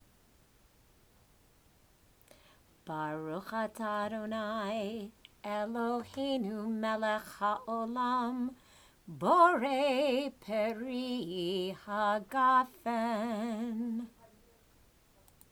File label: Borei P'ri Hagafen, student prayers; religious school